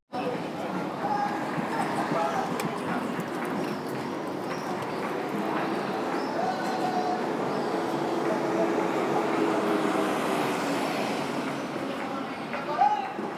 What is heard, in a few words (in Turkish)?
granada sesleri